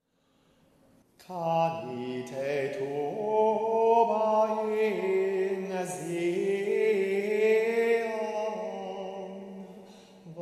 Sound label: Canite tuba